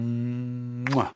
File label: Kiss; Loquendo